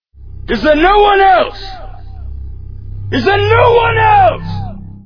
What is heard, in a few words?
rawr